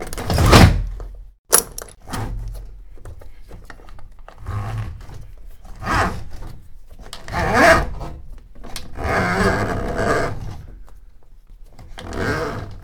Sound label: blinds up and down; NS Studios - ultra sound collection